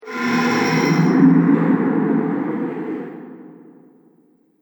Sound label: Dungeon Atmosphere, scary soundscape